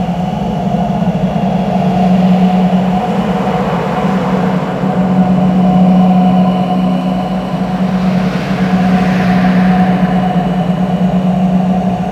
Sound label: Ice Interior Exposed Amb; Warframe; Corpus